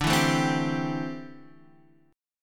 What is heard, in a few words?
D 7th Suspended 2nd Suspended 4th D, Guitar Open D